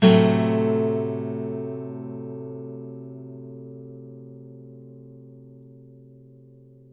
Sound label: BARI CHORDS, Blues Guitar; ILIO; Fretworks